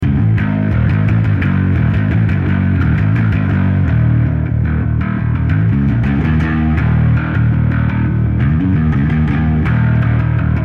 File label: Bass Solo